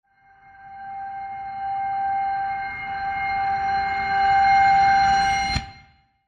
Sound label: Muted Bell Reverse